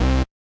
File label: saw, programmable speaker